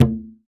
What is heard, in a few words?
Wadon, Roland BK-5 Percussion